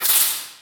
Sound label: injured; mir2; Return of Legends